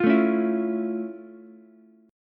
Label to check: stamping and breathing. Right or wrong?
Wrong